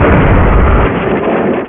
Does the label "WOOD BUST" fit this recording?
Yes